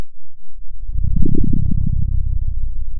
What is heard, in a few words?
Sound of a dinosaur